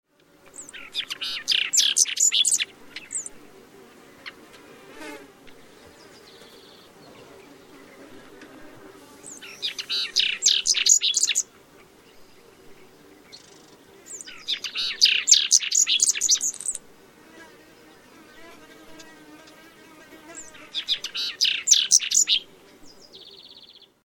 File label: lark like bunting